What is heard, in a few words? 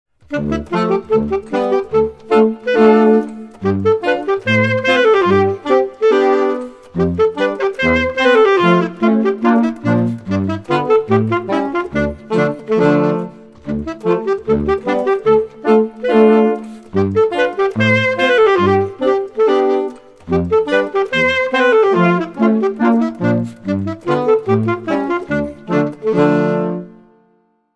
Romanian Folk Dances